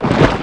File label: slosh; COMBINE